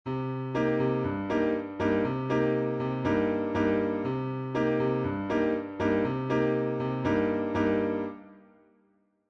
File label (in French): rythmique base basse bossa allege